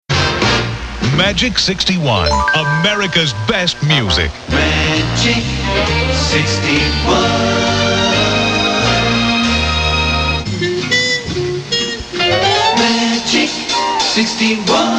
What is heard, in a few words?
Jingle Mix; KFRC; Magic 61; 1993